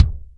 BD, Drum Machines

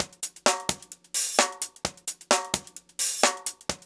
130, DRUMLOOPS

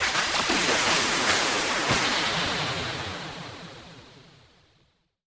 SPLASHER; Rarefaction; Poke in The Ear With A Sharp Stick

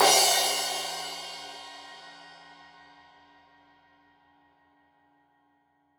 Crash 15in L edge, Cymbals Stereo

